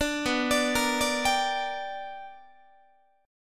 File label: B-Minor Major 7th Sharp 5th B down Guitar; BmM7#5 arpeggiated; 4A,4E,5A,5D,5G#,6C#; JGuitar